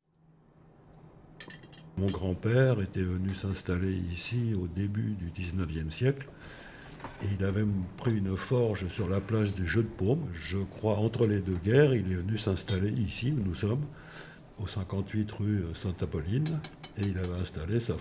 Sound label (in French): Forge; chalou